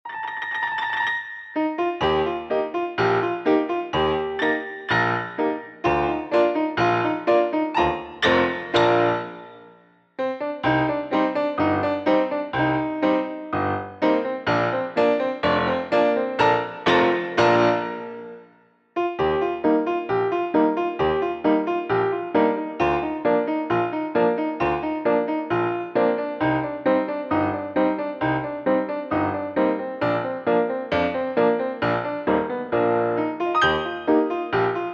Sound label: Song Pig Piano; baron fur is gonna fly; switch windows xbox one